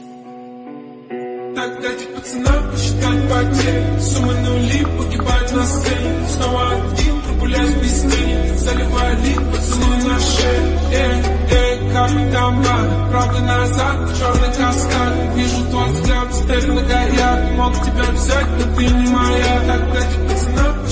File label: Music Ringtone